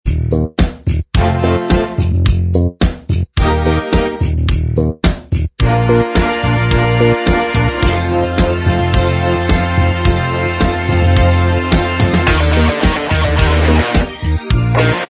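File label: instrumental track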